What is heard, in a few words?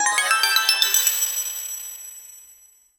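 a powerful down boom impact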